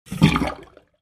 sink drain gurgle

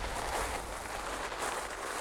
wallslide; hollow knight

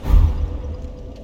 ui back; Dark Souls III

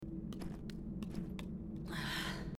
tired; Zelda - Breath of the Wild; link

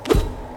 hit ground; hollow knight

